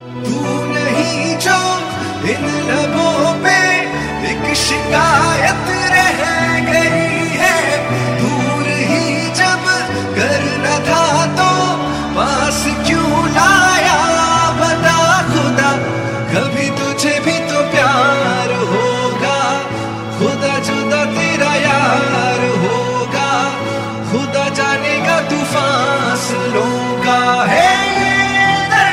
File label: old hindi song tone ringtone, bollywood